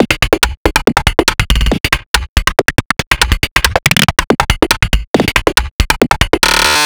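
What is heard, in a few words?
rhythm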